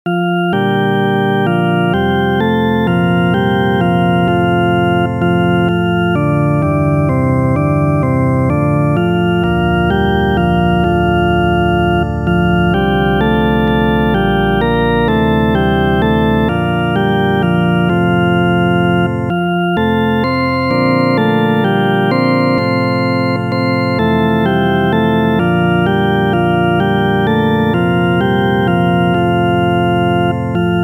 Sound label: solo organ, AGNUS DEI; ANGELIS